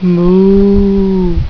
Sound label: moo